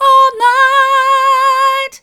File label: all night mid, vocal